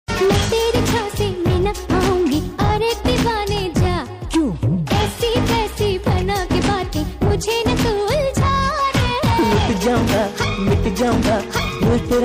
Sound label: hindi ringtone